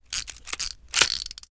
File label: profession engineering crafting tinker spring; empire game